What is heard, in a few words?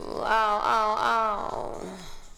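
voice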